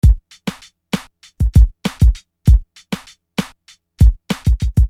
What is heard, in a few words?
Beat, Hip-Hop Street Beats